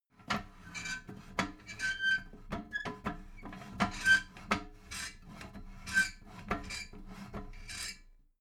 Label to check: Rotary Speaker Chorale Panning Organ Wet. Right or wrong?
Wrong